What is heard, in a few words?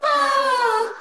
boom evil